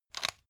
pepper mill fast; soundpool

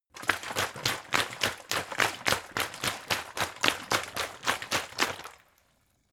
bottle water big shake slow; gastronomie; berufe

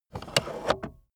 car accessories cup holder extend; autos; verkehr transport

